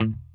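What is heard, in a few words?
6 string bass; Sampleheads; Whole Lotta Country 1 & 2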